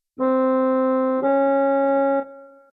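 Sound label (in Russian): орган интервал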